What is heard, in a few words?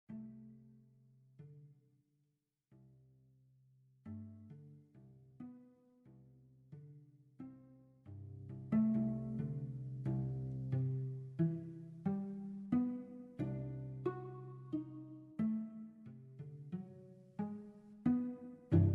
Suite for Solo Cello